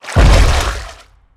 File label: walk water, footsteps; tank